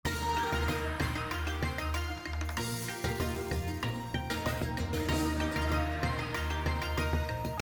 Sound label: BGM Super Wheel, slots; Panda Majesty2